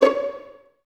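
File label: violins C#5 pizzicato, String Ensembles; Miroslav Vitous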